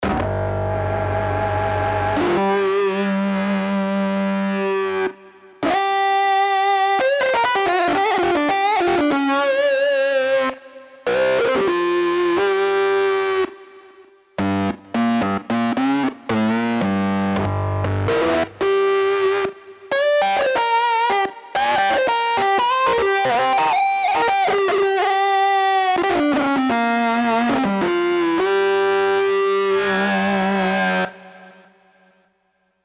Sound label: Velo-Crunch, Roland JD-990; Factory Patches